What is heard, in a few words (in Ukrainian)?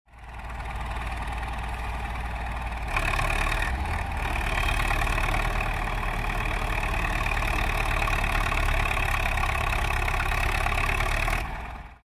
zvuk gusenichnogo traktora